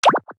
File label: boat drag